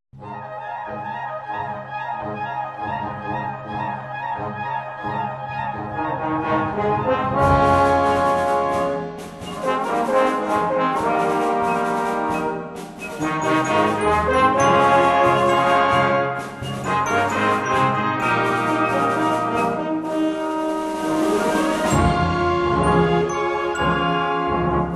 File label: band celebration